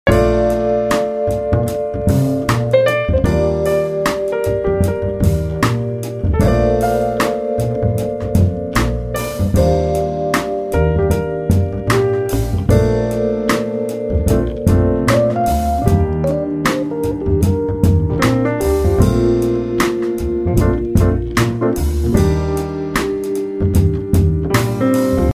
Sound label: loop